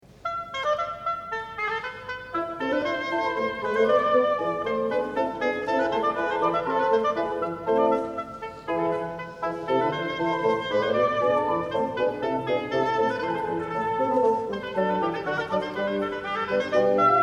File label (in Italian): Sonata in La minore per oboe e b.c.; organ; Sonata in A Minor (IV)